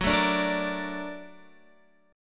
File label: A sharp Augmented Major 7th